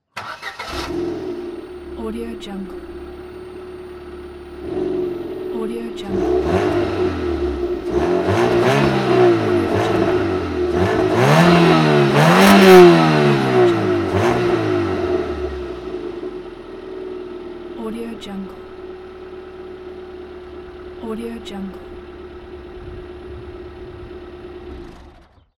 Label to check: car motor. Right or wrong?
Right